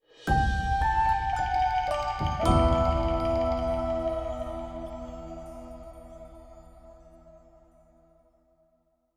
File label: complete Quest Stinger E